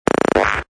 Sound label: growl fuzz bass; REMIXABLE COLLECTIONS; Kraddy Collection